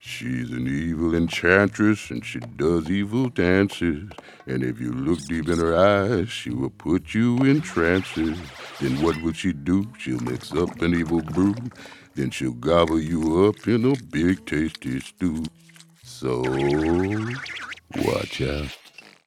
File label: Reprise (Vocals); My Little Pony OST Repository; Season 1; Bonus Music